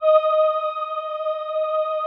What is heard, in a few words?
VP-330, String Machines